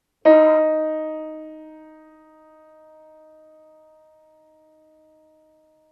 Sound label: piano D sharp